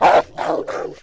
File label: wolf die vox; Civ4 warlords